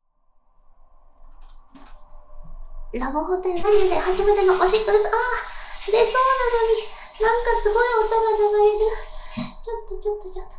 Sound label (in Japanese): おしっこ 放尿, ASMR